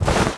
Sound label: robes pickup; ui; inventory